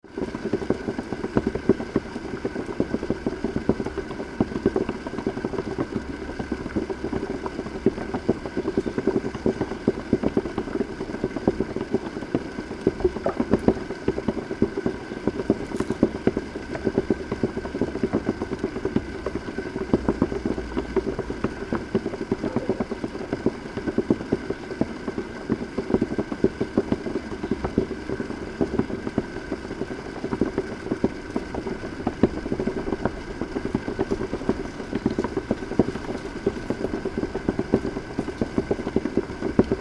Humidifier Bubble and Steam, Close shot; Close shot recording; Miscellaneous Household; Tom Hutchings

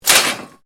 METAL CRASH; Metal Sound Effects; Metal (Sound Effects:Materials)

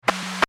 Emergency Radio Static Police Radio; Sound Design

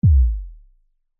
Bass Drop Descend; Miscellaneous Musical; Blastwave

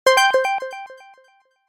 Short Multimedia Alert Effect, Chime; Alert asterisk; Chimes; Stuart Duffield

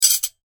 household cutlery, Cutlery Clatter; Metal Cutlery Clatter; Kitchen

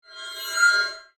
Gear Movement Alien, Metal, Squeak, Device; Robot; BlastWave

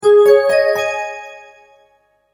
multimedia logo, Musical Identity/Signature

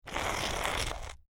Stone Grind Scrape Along Stone Pillar; A rock scrapes against even rocky surface

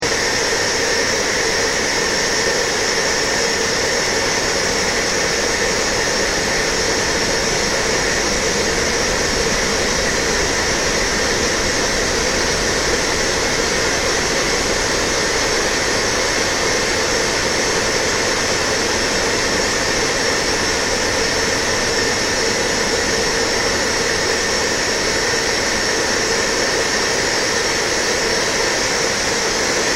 Ambient Noise, Drone; Sound effects created by manipulating other sounds; Drones; Sound Design

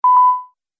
Multimedia Event Notification, beep sound effect

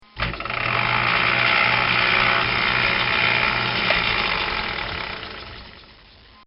Milling Machine, Start And Stop; Metal (Sound Effects:Materials)

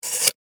Electric Timer Turn, Dial, Clicks, Antique; Technology; Miscellaneous Electronic; BlastWave

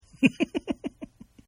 Giggle Male; Laughter; Partners In Rhyme

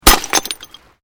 Glass pane puncture; Material components for constructing more complex sounds; Glass (Sound Effects:Materials)

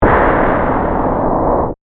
Distortion Hit, Reverse; BlastWave

